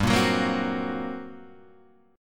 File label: G Minor Major 7th Double Flat 5th G, Guitar, Half Step Down